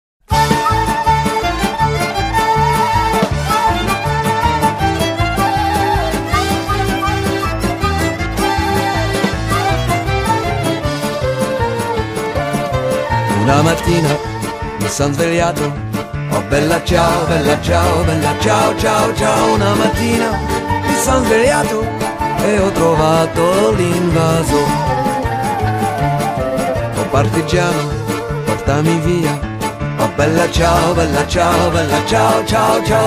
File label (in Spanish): musica